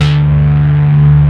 Prophet Five

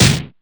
Crunch Snare, DIGITAL KIT; Roland MV8800

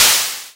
NOIS, Techno Trance; E-MU Formula 4000 Series Vol. 2

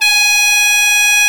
THAI PIPES; Exotic Winds

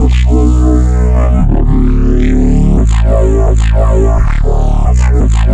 173 Bass, Drum n Bass; KJ Sawka; Drum n Bass & Beyond

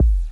Drums, Hits; DCM8

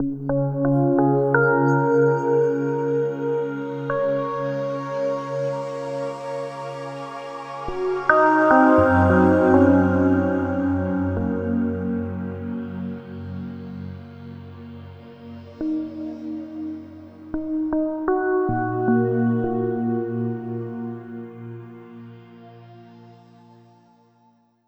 Ambient Keys

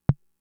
Tom, Fricke MFB-522; TOMS

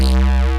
RAVE, RAVESYN

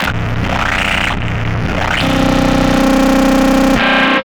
CARTRASH; USB Soundscan vol.01; Hard & Loud Techno; AKAI

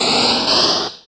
FX Dance

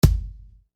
Kick; One Shots; Sonic Foundry (Sony Creative Software); Infinite Soul VOL-1

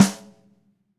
Ludwig Supraphonic Snare Room; Sonic Foundry (Sony Creative Software) - Vital Drums

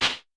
CLAP, Vermona Mono15 Drums; Stereo layers; blend with snare

